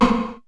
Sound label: 909 CRM, 480L; Wizoo; Platinum 24 Electronic; 480L GA 909